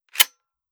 30-30 Lever Action Rifle Loading; Weapons; Foley

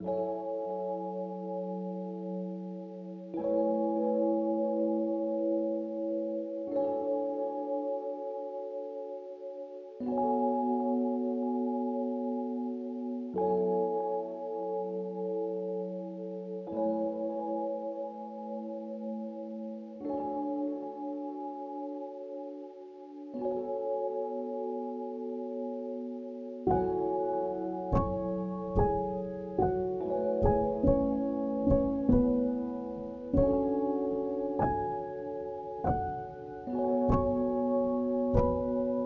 Lo-Fi Matte Brown Intensity

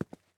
footsteps tennis shoes walk; Player Character SFX

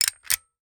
caulk gun handle squeeze metal bar clicks; Weapons

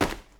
gym bag drop; Dropped Object

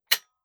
30-30 Lever Action Rifle Dry Trigger; Weapons; Foley

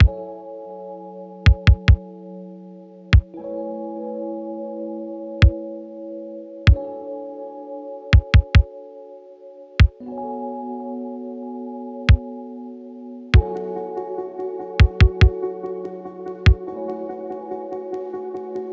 Lo-Fi Matte Brown Intensity

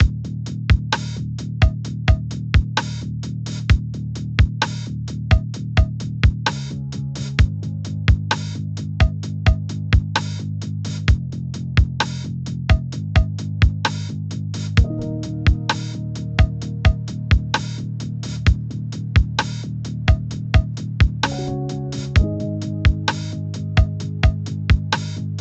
Lo-Fi; Bond (RT 2.769)

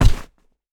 Tackle High, Foley Sports Soccer

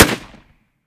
30-30 Lever Action Rifle - Gunshot; Weapons

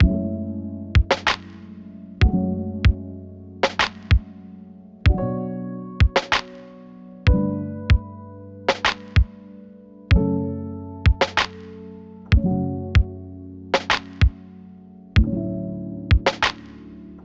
Lo-Fi A Drive at Dusk Intensity 1

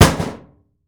metal heavy drop fall; Dropped Object

